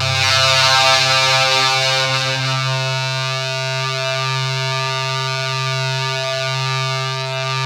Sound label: ZWEEP; Spectrasonic Distorted Reality 1